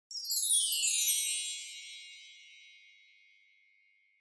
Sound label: Wind Chimes Down High